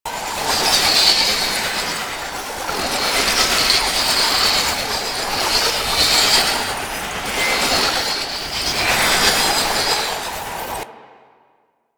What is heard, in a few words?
Impiety Projectile Loop; Warframe; Dagath